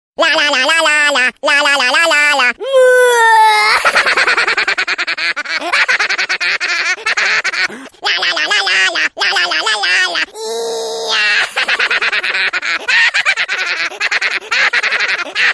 funny ringtone comedy ringtone la la la la ringtone